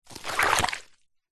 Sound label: foley wood stick movement slide draw line in sludge wet mud squelch